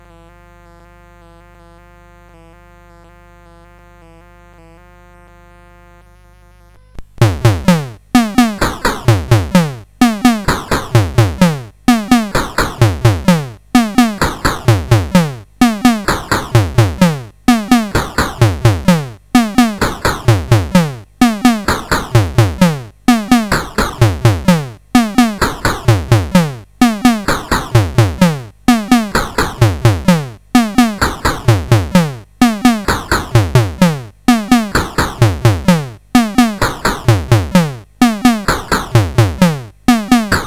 Drum Demo, CSG8580R5; SoundEffects

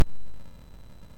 sid MOS6581R2, GAMES; Face Off

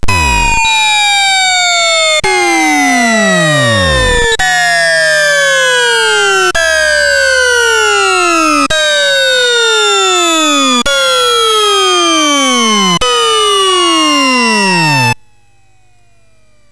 Hip Hop, sid MOS6581R3; Mermaid; Early Tunes

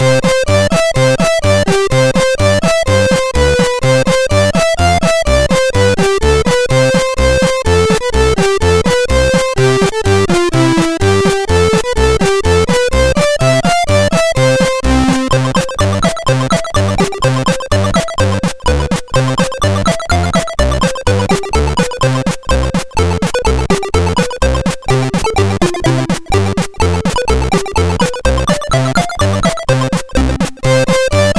sid MOS6581R2; Cowboy Duel

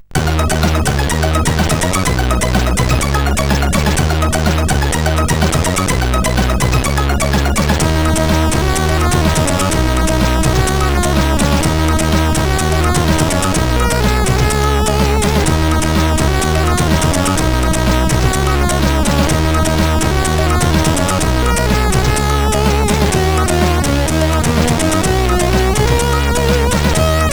tune, CSG8580R5

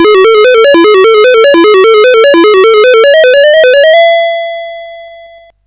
Buggy Boy, CSG8580R5

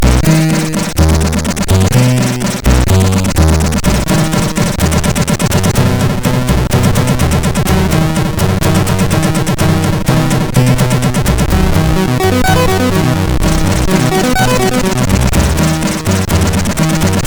Orchestra, MOS6581; MOS6581R3; hvsc

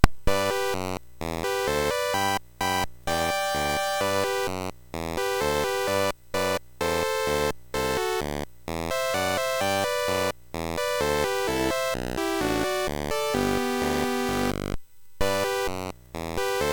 MOS6581R3, GAMES; Slinky

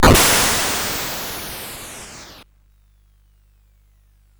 Frantic, CSG8580R5

